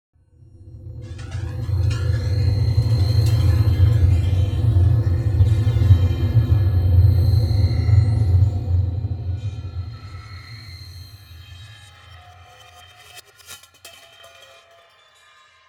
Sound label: Fire